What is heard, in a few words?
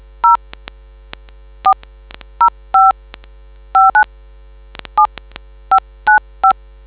dtmf